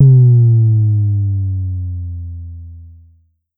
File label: Pollard Syndrum Sub